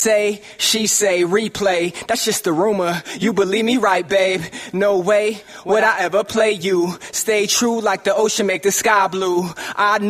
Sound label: acapella